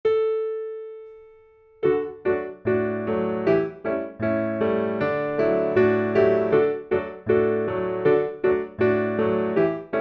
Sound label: Haenschen klein; schulleben